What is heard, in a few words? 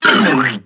level bird stretcher garbage regurgitate end